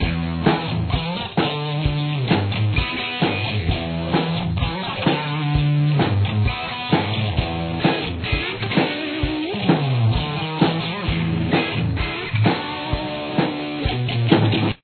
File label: breakdown, Here’s what both guitars sound like together with both bass and drums